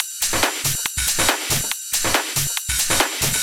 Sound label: Loop 140 BPM; Vengeance Samples